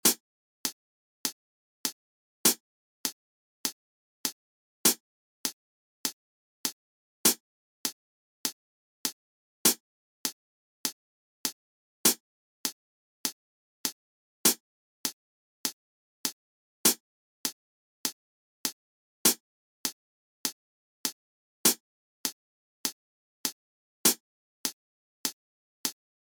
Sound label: Rhythm n feel metro